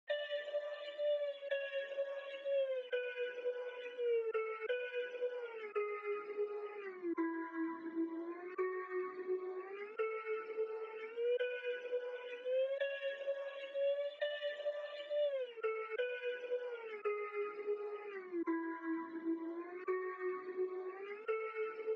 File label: 85 BPM F Min Flute; Loop Stems; Cymatics; Rushing